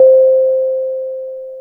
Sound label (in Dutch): Sinus, FM; Polyend